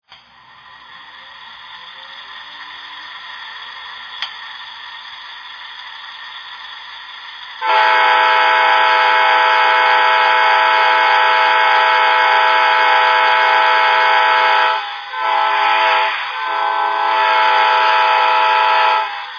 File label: aem7, trains